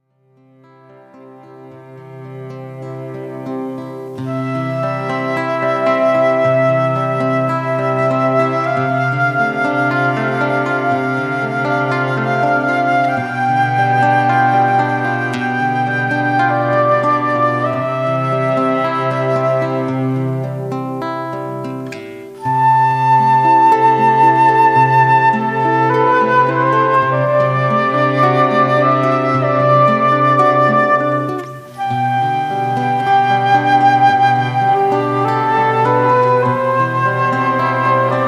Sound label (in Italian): Ave Flauto; Ave Maria - Strumentale; Gounoud